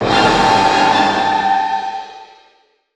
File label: mortification hit